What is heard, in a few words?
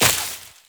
Step Veg, Footsteps Walk; Warframe; Characters; Tenno